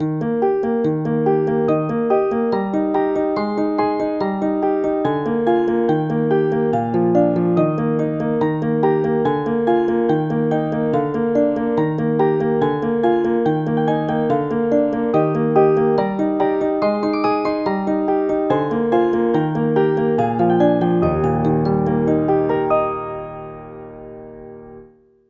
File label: Twinkle Inst; Purr Pals; NDS